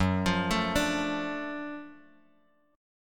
F sharp Minor Major 7th Sharp 5th F sharp down Bass Standard; arpeggiated